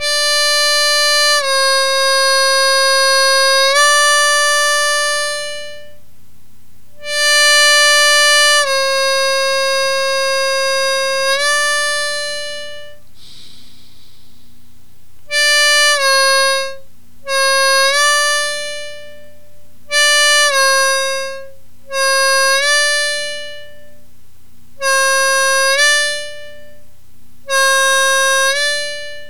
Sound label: bending, harmonica